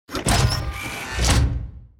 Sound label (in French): galet mise en place; La-Fabrik